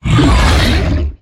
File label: Exertion Heavy; Evolve; Behemoth